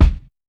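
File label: RAW bass drum, Drums Acoustic; AKAI; 90s sample CD; USB Soundscan vol.10; RAW KIT 8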